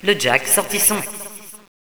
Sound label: kit; Villagina; ecole